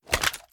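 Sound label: assault rifle reload extended magazine end; gmod; viper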